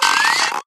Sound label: springrider squeak; video games; player; taunt; source engine; tf